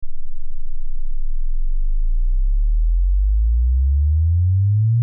LFE Test Tone Osc, Lfe Test Tone Oscillator Log Sweep 10Hz-120Hz; 5 Sec -12Db Log Sweep; Blastwave